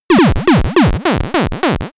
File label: boss fight, laser; soundpool